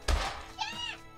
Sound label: kyaaaaa, Scream; IRyScream